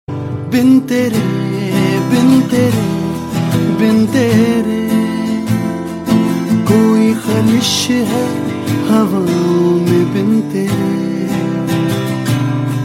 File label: Slow